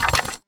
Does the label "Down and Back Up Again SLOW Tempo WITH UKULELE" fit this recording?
No